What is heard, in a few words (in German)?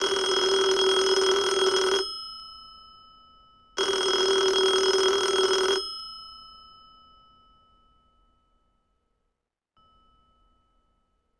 telefon ring